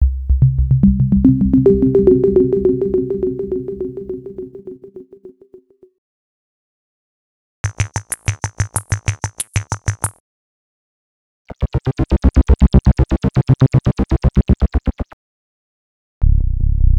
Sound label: Loops; X-Static Goldmine 5